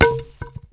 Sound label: grass; Mafie 1